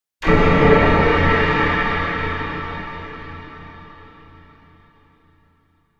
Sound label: Sting; Vacuulus